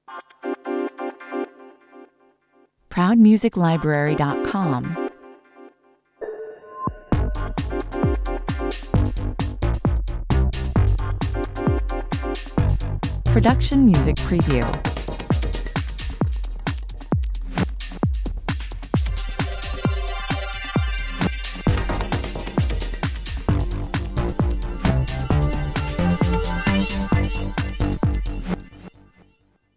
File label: electromash 132 bpm